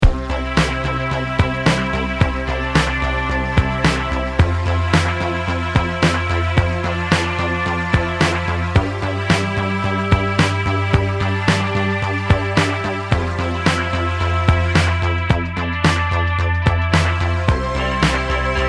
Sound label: synth